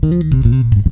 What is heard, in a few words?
run loop, bass, FX loops; Spectrasonics; Bass Legends